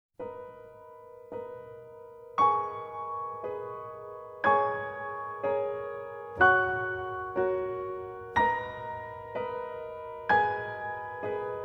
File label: piano; Neon Genesis Evangelion; Evangelion S2 Works; omakase version